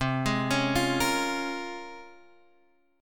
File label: Bb Minor 7th C; Bbm7/C arpeggiated